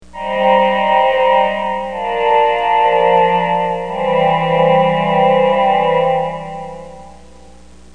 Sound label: vocal